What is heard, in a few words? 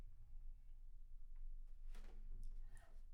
Bass Room